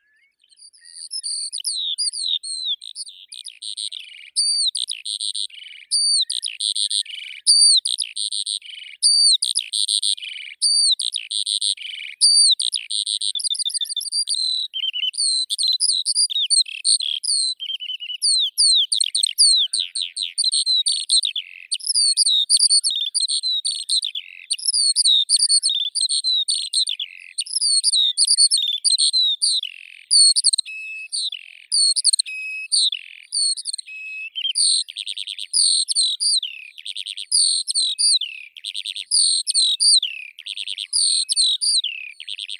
zhavoronok polevoi alauda arvensis

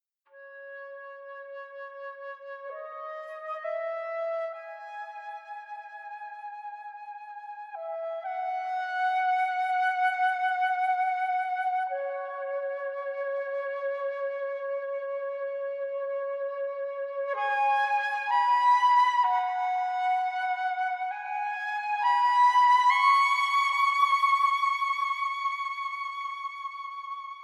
my journey flute

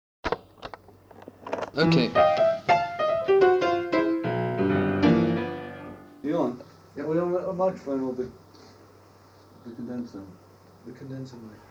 flourish

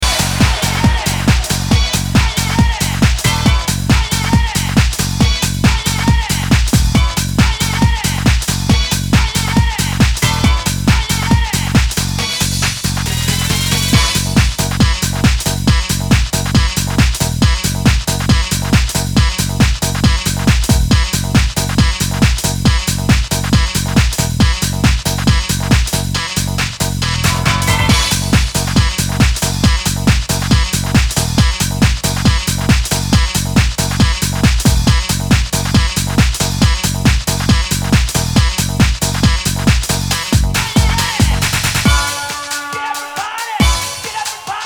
funky horn

